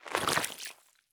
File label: stop puddle; Escape from Tarkov